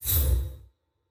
upper table appear; DraculasCastleWD; wazdan

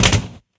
reels start; Burning Reels; wazdan

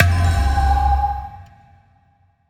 gamble mouseclick; Butterfly Lovers; wazdan

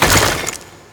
gamble draw; Butterfly Lovers; wazdan

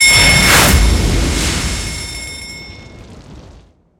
bonus bell x2; Burning Reels; wazdan

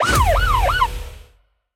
ultrafast bigwin; Burning Reels; wazdan

